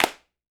CLAP L; Big Fish Audio; Ross Garfield Drums VOL-2; SHILOH KIT

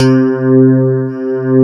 CLAV, FUNK FM CLAV; East West; Twisted Textures-BT

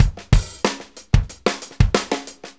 Fonky, Drum Tools, Loops; Sonic Foundry (Sony Creative Software); Loops & Samples Drum Tools

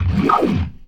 GLISS, ORGAN RIFFS; InVision Interactive; Keith Emerson

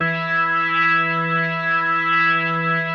E3S, B3

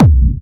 Clubby Kicks, Bassdrums

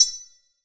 RIDE, CR-78 DRUMS; EdgeSounds; Drum Mashines VOL-1

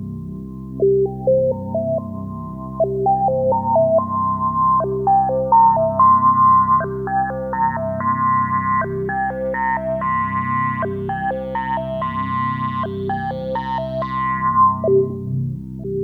Arpeggio, keys; Sonic Foundry; James Johnson Spektral Minimalism; Sublime Keys

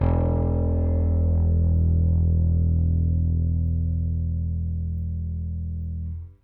RickThumb-C, RickenbackerBass

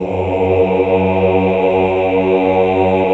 CHO F#1, CHO Ooh; Sound & Vision; Gigapack I CD 2

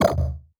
laser thing, fx

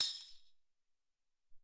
Tamb Lo, Alesis SR-18

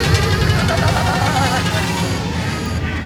Kaos FX, Ambient Grooves

